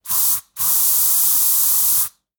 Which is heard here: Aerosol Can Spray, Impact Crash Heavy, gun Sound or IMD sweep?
Aerosol Can Spray